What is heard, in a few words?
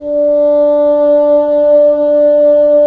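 wind bassoon; LA Composer vol. 3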